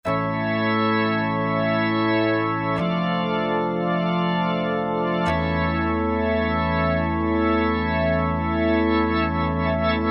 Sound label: Full Org+RS, Korg MSC-9S Organ